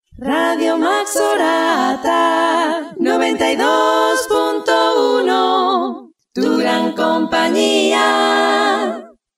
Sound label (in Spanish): jingle cantado; maxorata